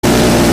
minigun

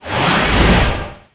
Heal

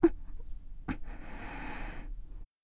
Breathing

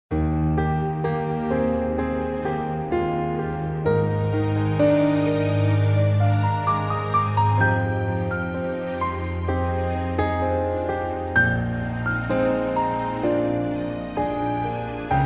music story common

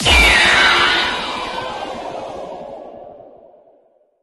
Magic

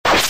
iron sword

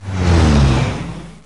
airplane select; Pacificwar

